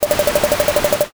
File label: gear